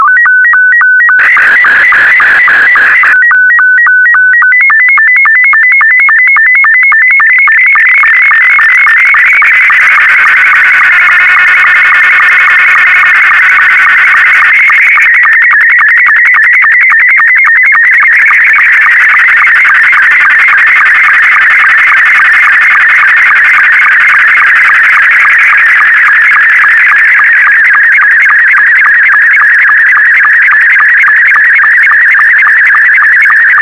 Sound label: sstv